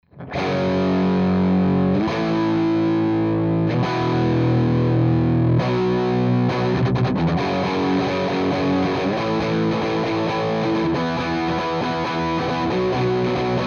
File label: scumback m75; harsh marshall tone